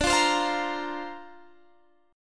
D-Minor 7th Sharp 5th D Guitar Half Step Down, chord